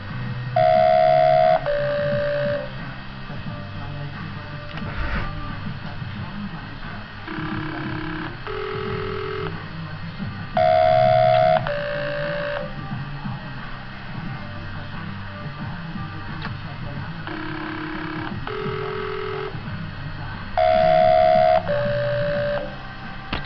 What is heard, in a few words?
annex tones and bgmusic, Phone Tones